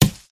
fur tree block

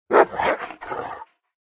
dog demon attack voice bottom

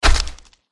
dragon boss attack contact up

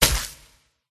attack contact

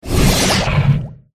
hydra attack voice mid

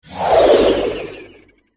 polar bear

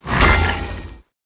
Rat block

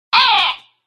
raven attack middle

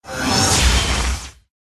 Pet Elemental Light

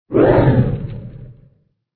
fire attack voice bottom; krofdor

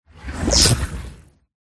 jelly fish attack voice

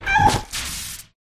Pet Manul Die; manul lvl 5

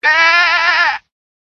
goat attack voice

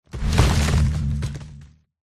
tree attack voice middle

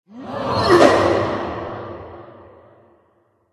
angel attack voice bottom

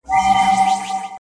Light Spirit Die

